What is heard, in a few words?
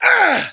choke